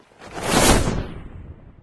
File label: owl fly; animalsvotive; Minecraft; Votive Content